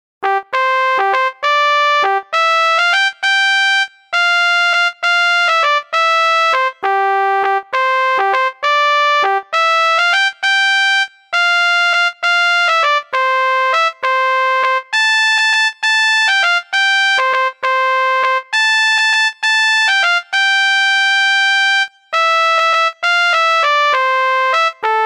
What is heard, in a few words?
Trumpet Solo; パズーっぽい